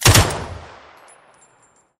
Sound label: Gun Sound; Gangstar; Gully Loops